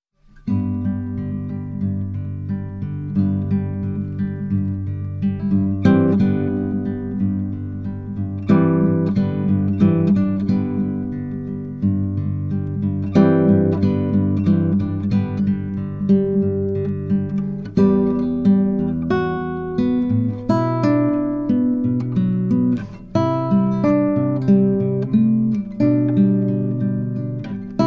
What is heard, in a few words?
Guitar sketch